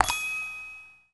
Character pop out

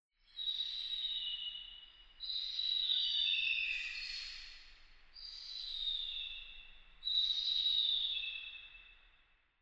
Zion Eagles

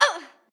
Hurt; Jackie; Going Under

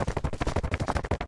Flap Wings; Lethal Company

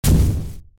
Stomps; SFX Monsters ForestBoss

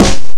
Snare, F#2; Guitar Rock Tour

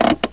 Menu - Car Off

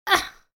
Hurt Grunt; Isaac; The Binding of Isaac

